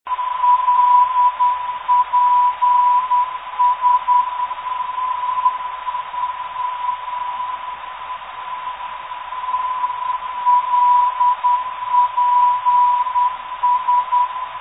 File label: lps 403khz, NDB